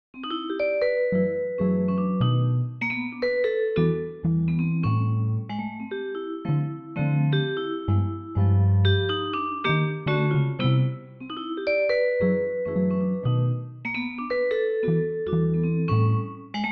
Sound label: vibes; pianoteq7